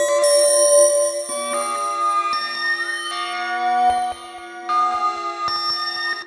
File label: Weirdbell Gruv; Sonic Foundry (Sony Creative Software); Synchro Funk